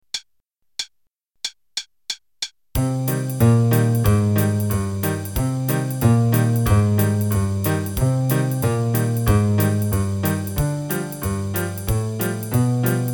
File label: Boogie (teacher, bass, drums qn184); Level 1 - page 36; Boogie on Broadway